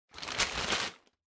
Packing Paper Rustle; 梱包紙; ガサガサ; 梱包材

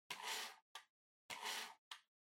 PC-Mouse-Move (Far-Hit)

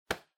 Hand Foley Pat Gentle

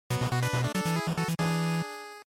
GB Action Start

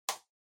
PC Keyboard Far Enter Hard

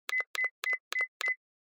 Cell Phone Hardware Button Beep

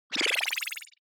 Scene Change Up Stereo Pan

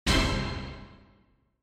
Quiz Question Dry

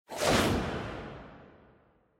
Motion Agility Reverb

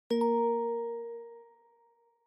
OS Sound Notification

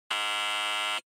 Electromagnetic Buzzer, Dry

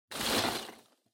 Loose Fill Packaging, Rustle; 梱包材

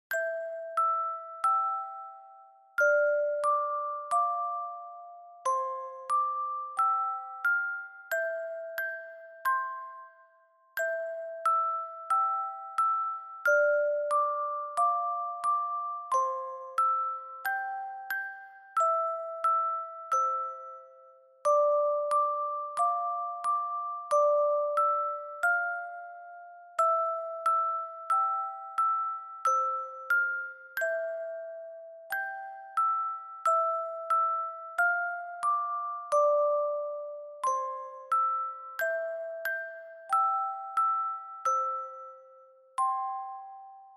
Reverb Slow

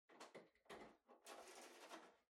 Air Conditioner (Far Filter Remove)

